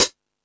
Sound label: High Hat, Drum Machines E-MU Pro-Cussion